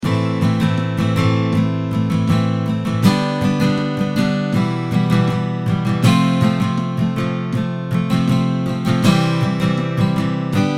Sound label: strumming pattern